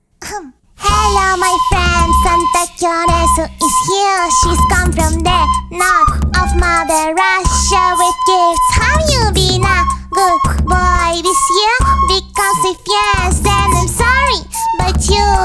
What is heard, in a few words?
Christmas Rap; Santa